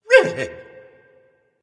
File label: grunt hurt; smite; janus